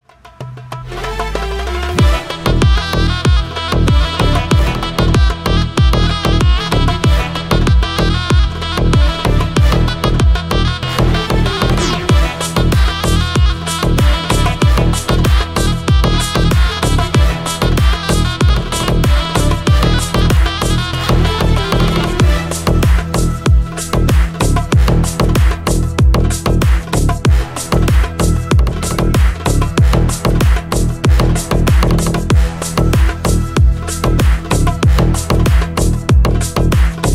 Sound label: arabic remix